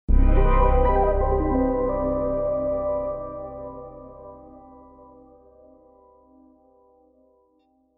Secret Room Melody

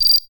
Panel Admin Card Accept; Among Us

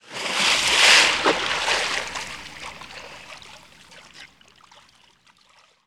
water splash mill lade; The 39 Steps

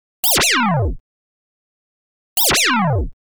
Own Normal Shot; Oretachi Game Center Zoku: Terra Cresta

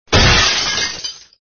SFX sound (shield shatter); Red Baron

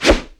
Weapon Stick Dull Miss; Inotia 3

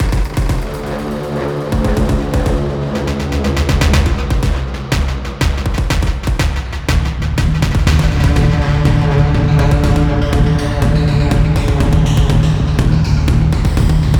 pulse

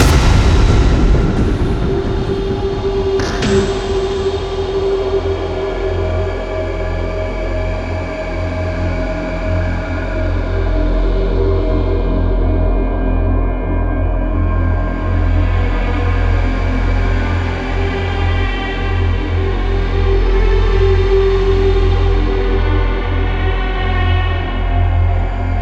Waltz; Killing Floor

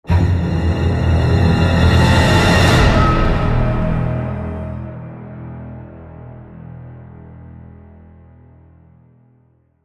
Stinger Short Crescendo; Call of Duty Modern Warfare 3

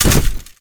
UI Item Armor; Inotia 3

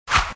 slide; Gun Mayhem 2

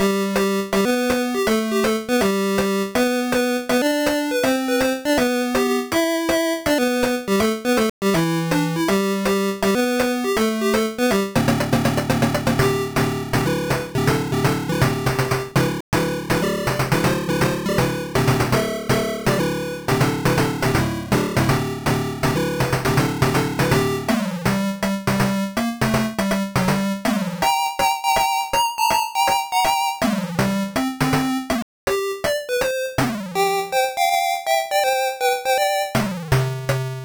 Ten Green Bottles, Traditional, Tandy 1000; lemmings

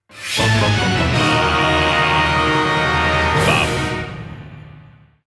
4th8th Place Goal Fanfare; Tire and Ice; crash bandicoot series sound box

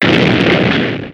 Missile Borken

